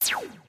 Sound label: fire laser; Adventure Time - Angry Betty; ThrillGames